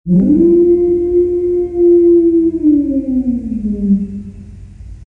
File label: wolf; Garry's Mod; tf2